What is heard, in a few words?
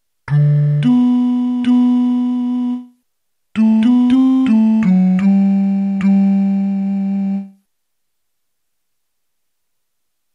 tenor